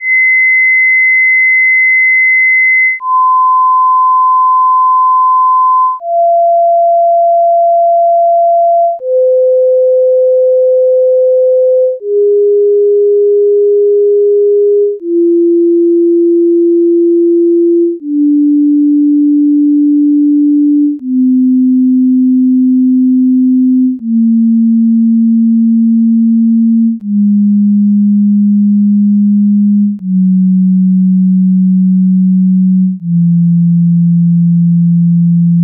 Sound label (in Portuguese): serie subharmonicos